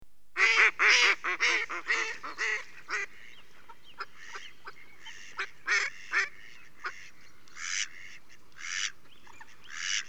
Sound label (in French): Canard